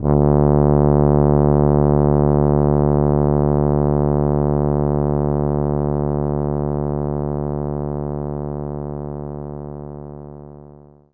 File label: Tuba D1; knights try